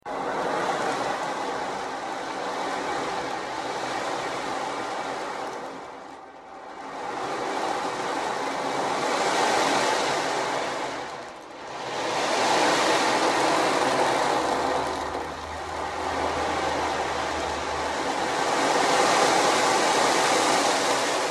Tambour de l océan

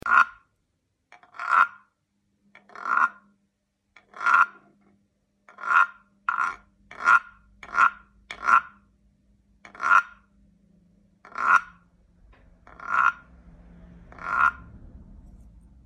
Guiro grave

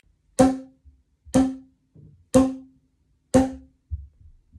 boomwhacker

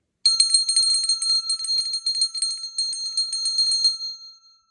clochette MI

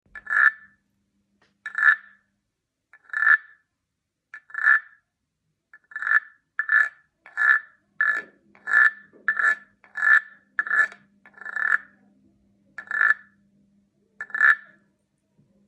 Guiro aigu

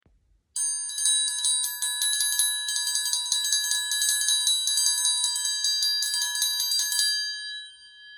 Triangle son trésor

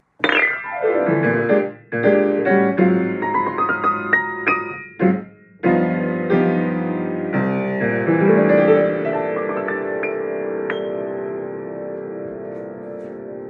piano jazz

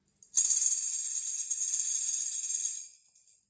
Sistre

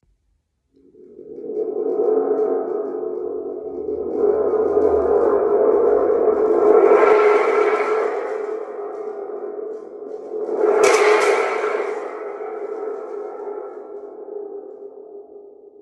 Tube de Tonnerre

